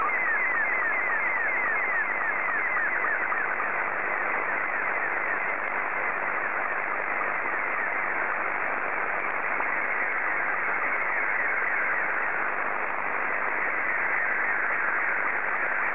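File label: SSTV, Scottie 2; JA3OEN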